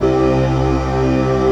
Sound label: PAD -R, 168 BPM Drum & Bass; Ueberschall; Drum & Bass Resonance